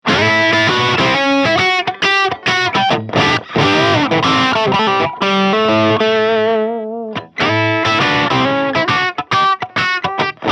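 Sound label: Crunch, guitar; ESP LTD AA-1 BLKS Alan Ashby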